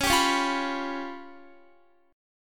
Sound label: C sharp 7th Flat 5th, Guitar; C#7b5; strummed; tuning=4E,4A,5D,5G,5C,6F; guitar chord